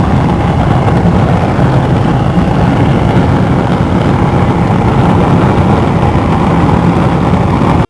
gas bottle; Max Payne; restaurant

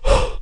ranger pant out

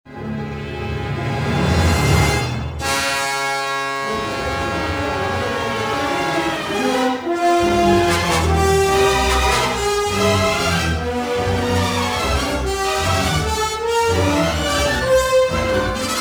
action, music; narshaada; Star Wars Jedi Knight II Jedi Outcast; XBOX; ns streets

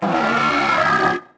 droid gonk die

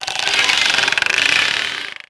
shotgun trap creak; Max Payne; hotel

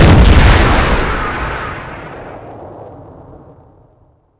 sam launch, weapons; Soldier Of Fortune II Double Helix; XBOX

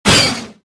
fire; Star Wars Jedi Knight II Jedi Outcast; XBOX

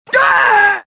death; luke

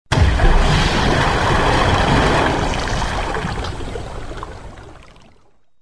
drill splash; movers objects

